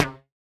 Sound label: Elastic Band, household rhythm samples Hits